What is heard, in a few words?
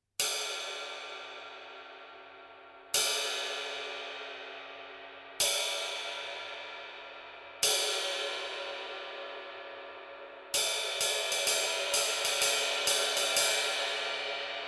hamm r, cymbals; crescent